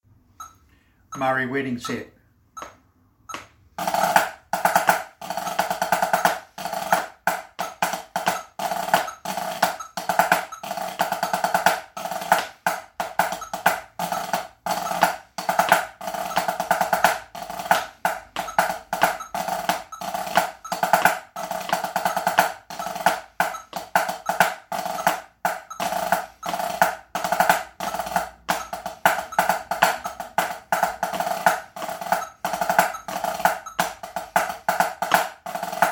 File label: Mhairis Wedding set, drum